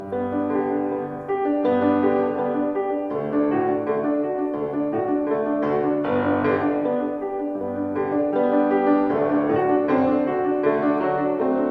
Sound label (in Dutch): begeleiding piano; eine kleine nachtmusik; improviseer een nieuwe melodie